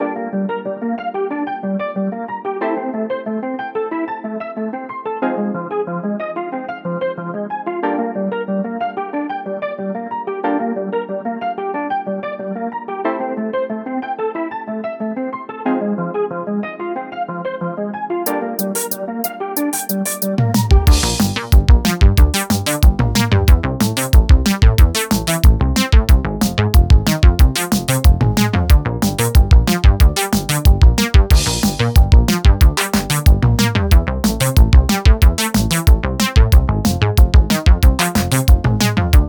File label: Snap Bass; Nasty Ostinato Ad Nauseam; Caron